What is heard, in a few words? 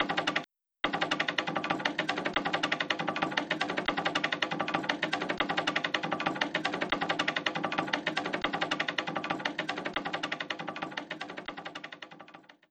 spin, casino; gmod; pcasino